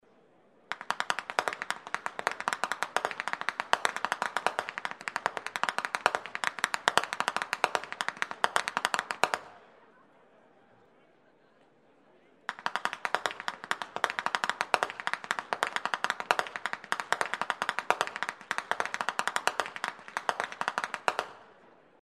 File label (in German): schnalzen; rupertigau